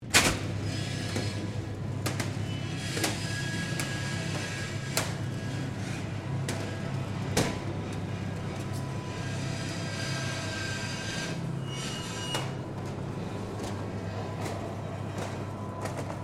Door rollerdoor small supermarket opening, Small internal roller door; Miscellaneous Doors